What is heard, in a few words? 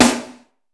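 ROOM SNARE, ROOM KITS; Crypton - DrumBase5000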